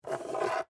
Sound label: Skeleton Key Up, Pick; Foley; Jangling; BlastWave